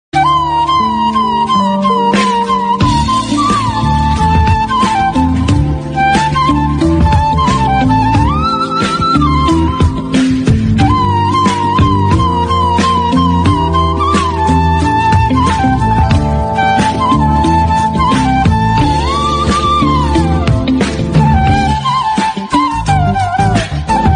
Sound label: love bgm ringtone south indian bgm ringtone tamil ringtone